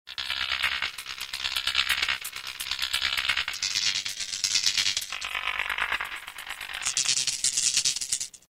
Dance Seq; Roland M-DC1

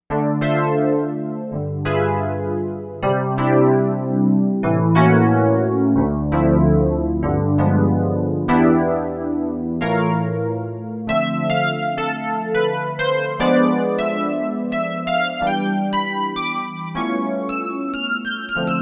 Super E.P; Factory Patches

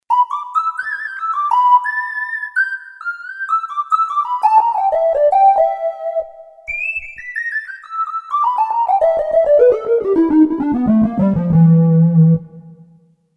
Whistle Lead, Roland JV-880; Factory Patches

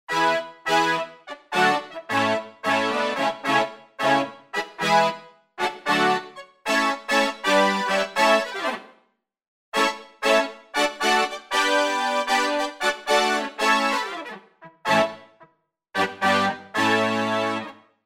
Sessn Brs, Roland SRX-07 Ultimate Keys

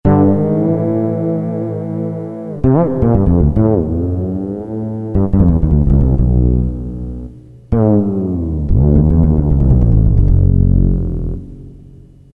Fretless, Roland MT-32

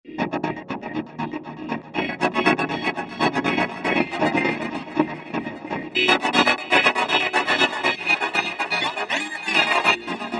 Roland V-Synth V-ocoder; Factory Patches

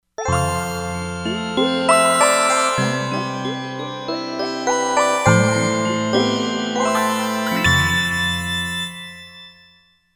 Gopiano

